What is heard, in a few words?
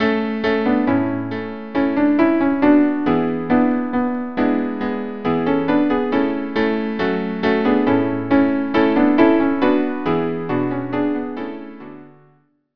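cello piano piano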